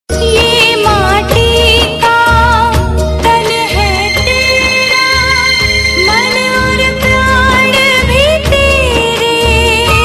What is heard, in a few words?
Gopal Krishna Aarti; Saath Nibhaana Saathiya